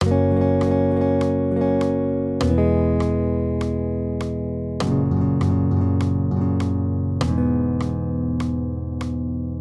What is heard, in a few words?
maj triads